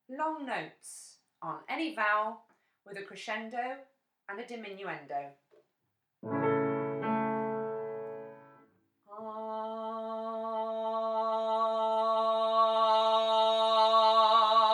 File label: vowel cresc dim messa di